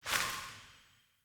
Sparrow Death State One Wing Flaps; Animals

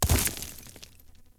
Horse Surface Kickup Dirt; Warframe; Duviri Horse; Vehicles

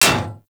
Bullet Impact Generic Enemy Shield; Weapons; Surface

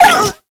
Kubrow Feral Vocal Pain; Warframe

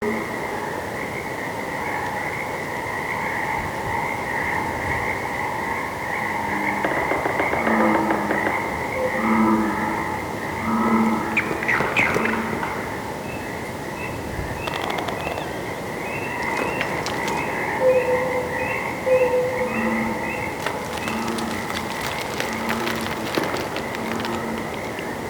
Day Amb Loop, Ambience, Glades; Glades Of Andurin

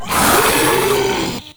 Proto Infested Ancient Vocal Pain; Warframe

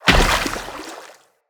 Moa Combined Step Water, Footsteps

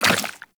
Step Goo, Footsteps, Walk; Warframe; Tenno

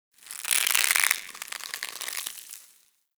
Tendon Stretch Medium Close; Ambience; Warframe; Infested Eris

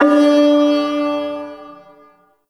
Shawzin Oct One D; Warframe; Duviri Ersatz Shawzin

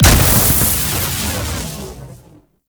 Plunge Ability Angry Explosion; Enemies; Abilities; Warframe; Duviri; Dax Knight

